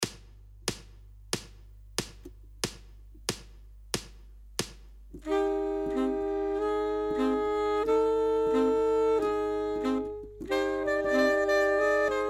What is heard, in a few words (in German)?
alle Stimmen